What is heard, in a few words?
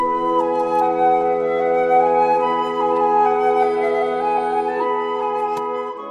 morning mood